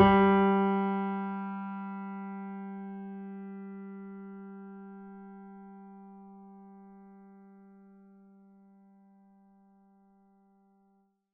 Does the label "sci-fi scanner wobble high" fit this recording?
No